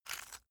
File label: stone scrape short; natur-tiere; steine